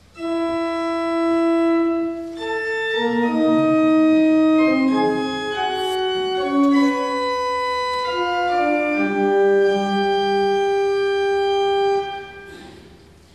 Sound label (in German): atonalitaet orgel